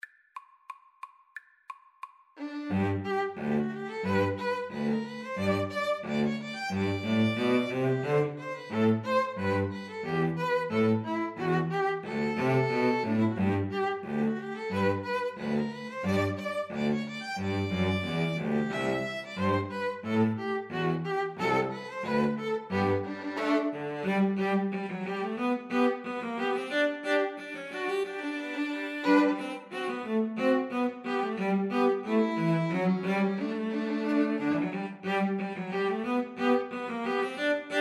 Piano Trio

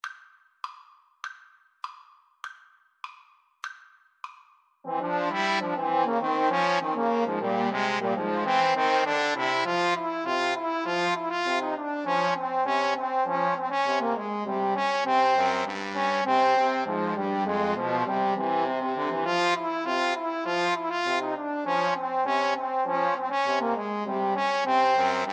Trombone Trio; thats a plenty

